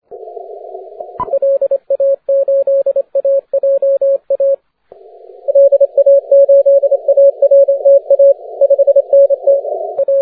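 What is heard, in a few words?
40m continuous wave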